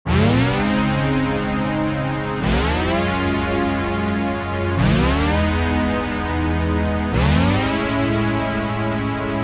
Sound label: Pad; Souley; FOCUS Preset Pack